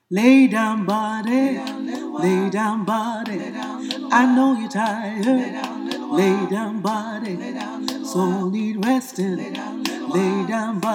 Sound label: Bass; Lay Down Body